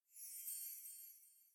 sparkling bubbles drag; Samsung; Galaxy Note 5; ui

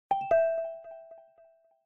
Drop, notifications

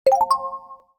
StarShip; Asus; ROG-Phone-6

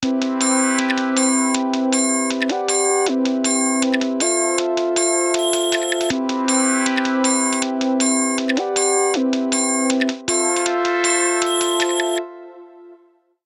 clockwork music, alarms; Sony Xperia C

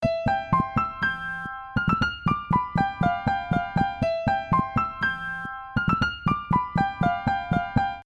Classical Piano, ringtones; Motorola; Edge 70 Max China

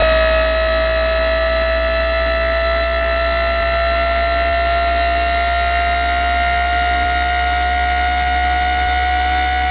Camera Zoom In; Samsung; SCH-A915

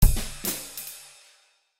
Jazz enter application grid

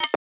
Sharp; Nothing; Phone (4a)

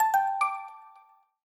Blink, notifications; Lenovo; A Plus A1010a20

